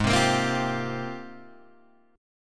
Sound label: Ab Major 13th, Guitar, Open G